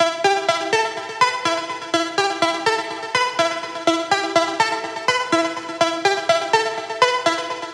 melodic techno

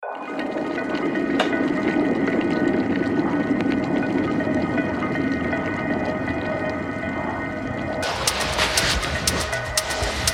experimental foley reworked textures; sample magic; sm101

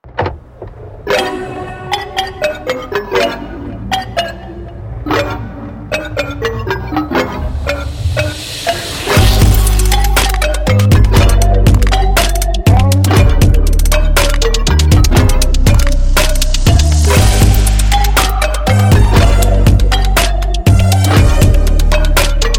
pop music sounds of life; make pop music

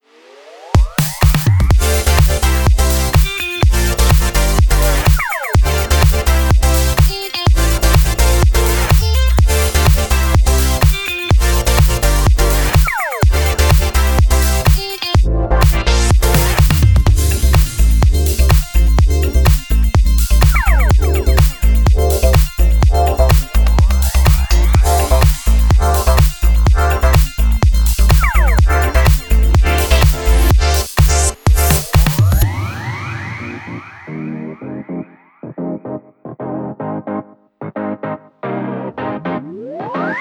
disco; orbit sounds; disco planet

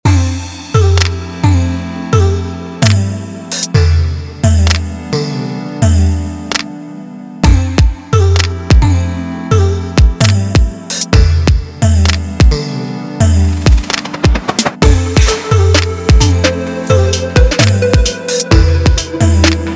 Melodic Future Beat; sample magic

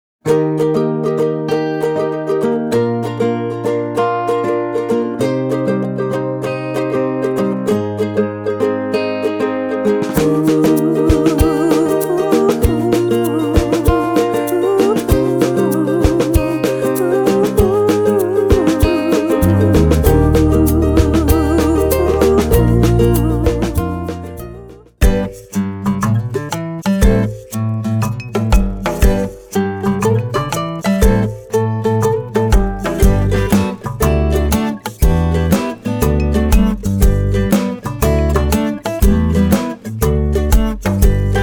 pop ukulele and island sounds; big fish audio

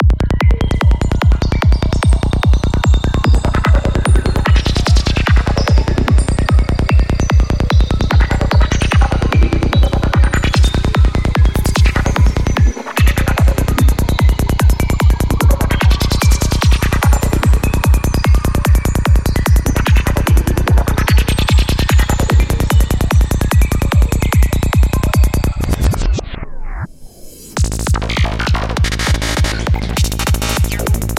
Psytrance Basses; mute production; psytrance bass vol 1

